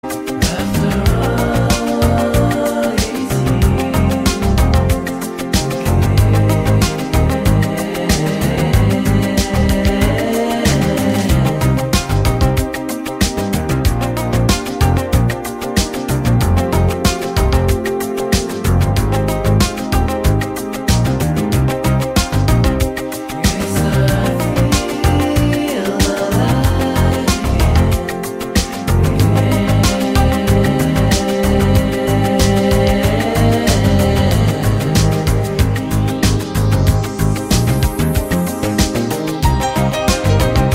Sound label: CARILLON